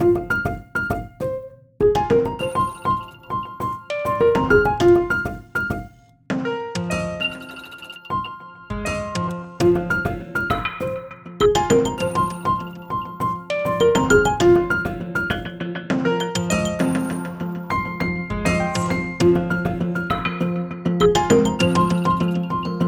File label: Piano taps